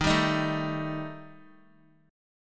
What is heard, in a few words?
Eb Major Flat 5th Eb Guitar; EbMb5 strummed; x,6,7,8,9,x; JGuitar